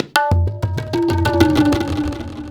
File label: TABLAS; Zero-G; Chemical Beats